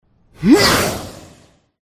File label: mage student attack voice up